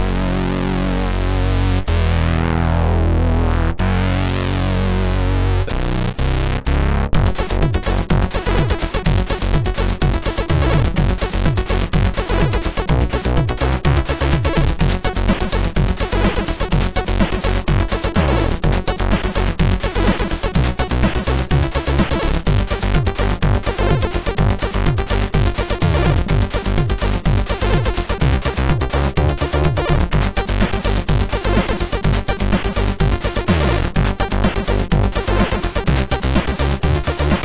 Intro, sid MOS6581R3; Arcade and Deadline

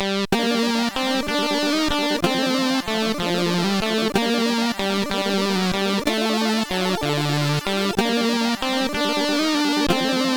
intro, CSG8580R5

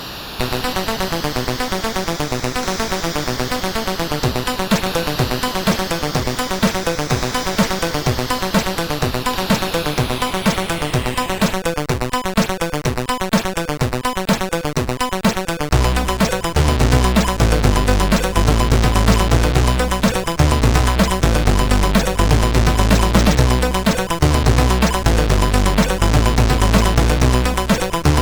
Dethrone Song, CSG8580R5

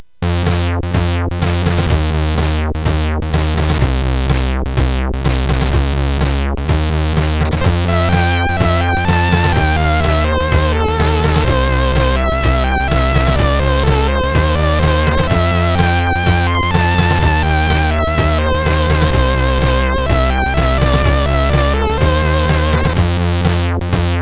Magic Sound, sid CSG8580R5

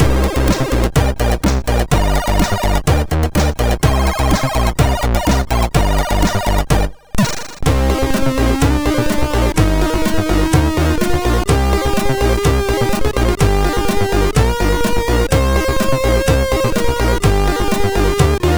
Funk Extend, MOS6581R2

